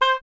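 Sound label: Wind OBOE C; Miroslav Vitous - Solo Instruments VOL-2; Solo lnst. II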